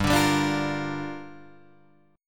G Minor 7th, Guitar, Drop D

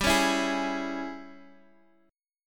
Ab Major 7th Suspended 4th Sharp 5th G

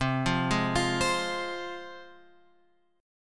C Suspended 4th Sharp 5th, C, chord; Csus4#5; {8 8 6 X 6 8} arpeggiated

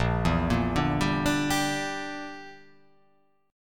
A sharp 6th Flat 5th A sharp; 6,7,8,7,7,9,9,x; down

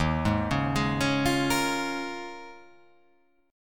D sharp 7th Suspended 2nd Suspended 4th

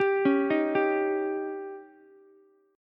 C sharp Suspended 2nd Flat 5th C sharp Ukulele; arpeggiated; JGuitar

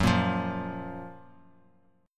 Gb Major 7th Gb down, chord; GbM7 strummed; JGuitar

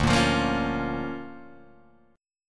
D Minor Major 7th Sharp 5th, D, Guitar, Drop D

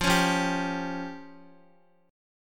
F Suspended 2nd Sharp 5th F down Guitar; Fsus2#5 strummed; 4F,4A,5C,5G,5C,6E

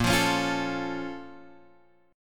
A sharp Minor Major 7th, down, Guitar, Drop D

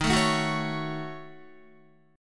D sharp Minor 7th Flat 5th, Guitar, D#m7b5 chord; strummed; JGuitar

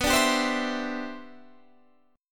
G 6th Add 11th B, chord; G6add11/B strummed

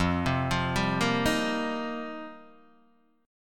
F 9th Suspended 4th F Guitar Open D, chord

